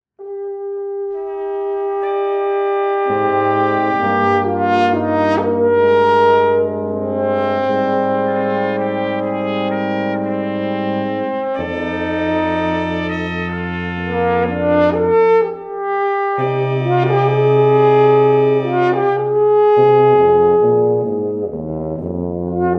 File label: WESTERN PLAINS ARTISAN BRASS